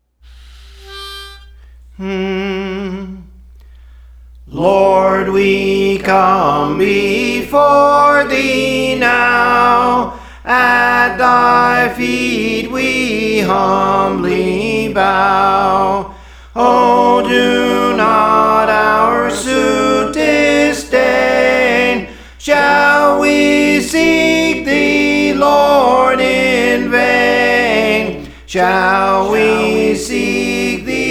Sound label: Soprano, Songs; Praise For The Lord Training; Lord We Come Before Thee Now